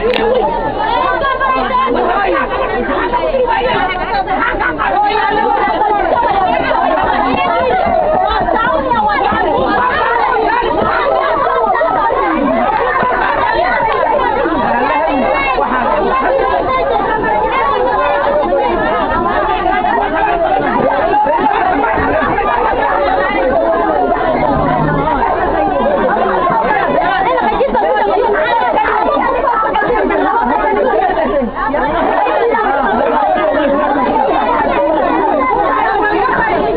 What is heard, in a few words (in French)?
son train; Ethiopie